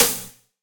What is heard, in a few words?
drum hit whistle